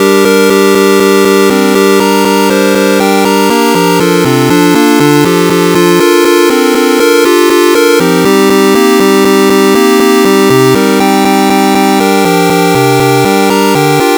square minor pentatonic; Chiptune Minor Pentatonic; Minor Pentatonic Scale; music generation library in Rust

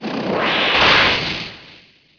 blaze kick; Pogo

